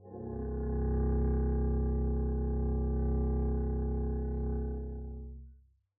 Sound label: arco c1 p up, double bass